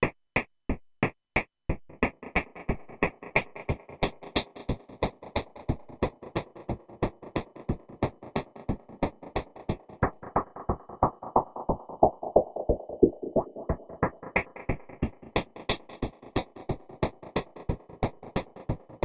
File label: Buzzy Chip Drums; Freds Lab